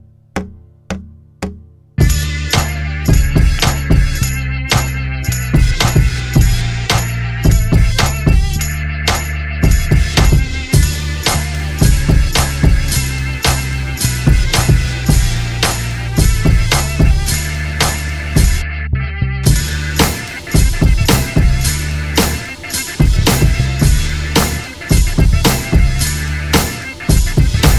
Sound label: Clubing